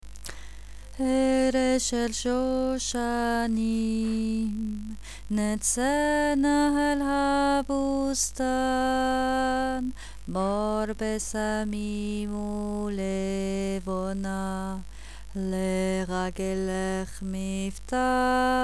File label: erev basse